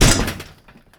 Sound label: land metal grate, footsteps; player